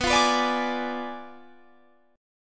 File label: B Diminished 7th B down Guitar Standard